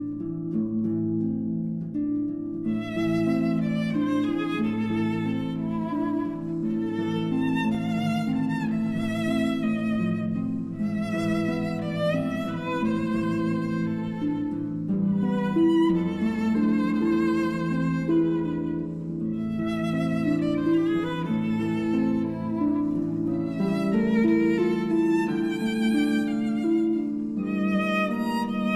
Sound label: Berceuse, for violin and harp